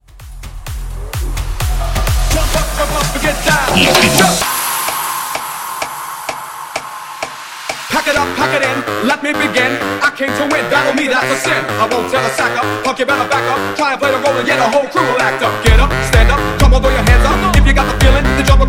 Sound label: Latino Festival Bootleg House